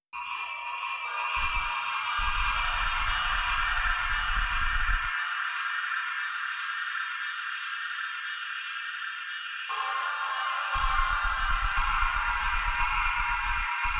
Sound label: weird ambient; Alter Echo; PS2